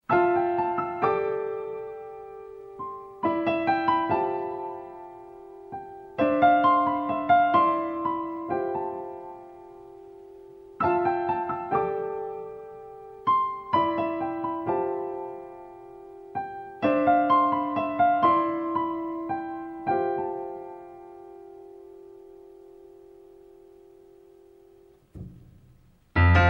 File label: blue piano